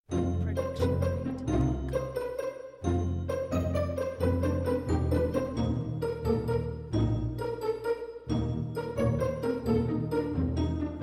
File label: Ident Pizzicato; SoundsCrate